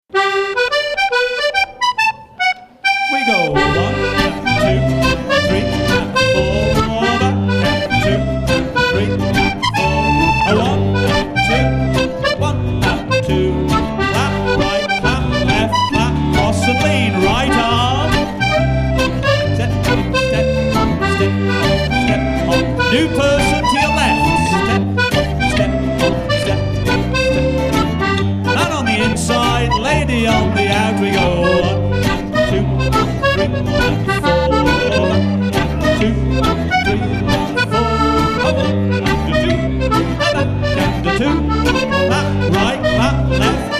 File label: Dance English Hornpipes with Caller